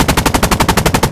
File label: loop nshk